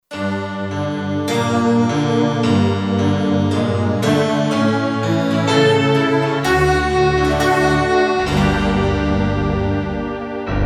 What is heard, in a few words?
Magic Orchestra, Kurzweil K2500X; Factory Programs